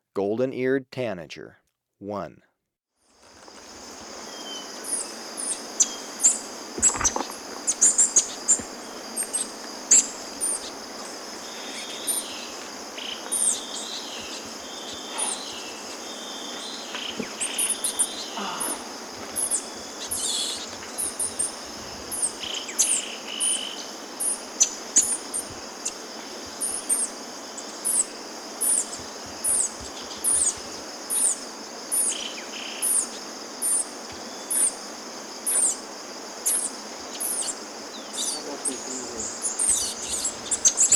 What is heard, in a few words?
Golden-eared Tanager, Birds of Ecuador; S. America